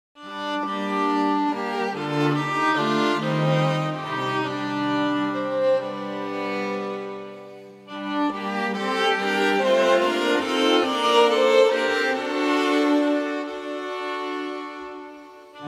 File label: STRINGS